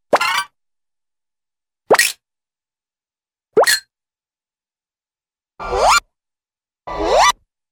Quick Musical Gliss; Cartoon; Hollywood Edge; Cartoon Trax

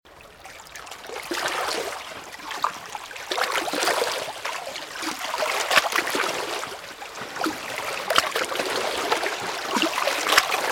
Ocean - Waves - Underwater; RadwinIsland

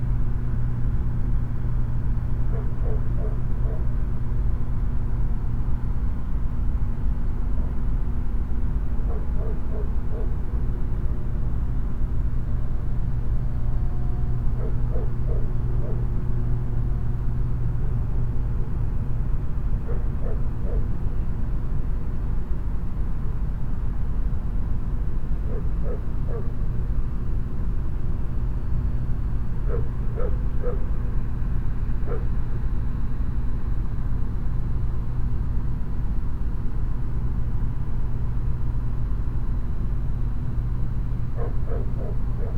Suburban din night electric hum dogs bark; boom library

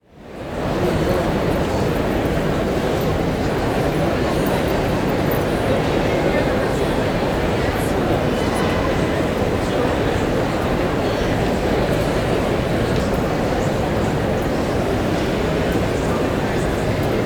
Crowd Indoor Large Hall, Walla; Sound Ideas; Walla Series

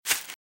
Velociraptor - Footstep - Grass - Run - Right

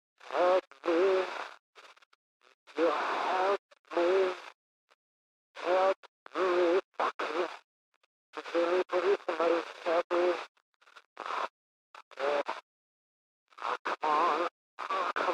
Radio; Blind Survivor

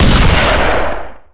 Shotgun Shot, Weapons; SFX Kit

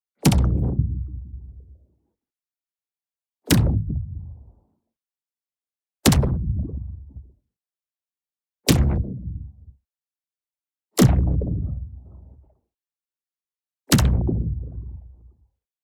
IMPACT MEDIUM Bouncy Slaps; boom library; Matter

